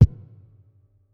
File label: Kit, breakcore Drum Hits